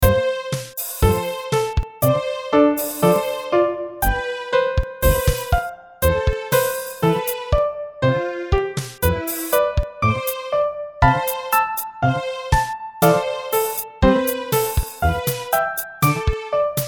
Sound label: First Midi